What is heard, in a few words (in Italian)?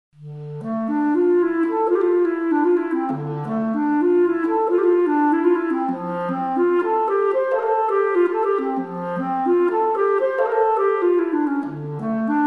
Preludio x cl solo